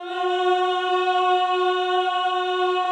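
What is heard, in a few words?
Choir F#3, Ehh; Eeh + Ehh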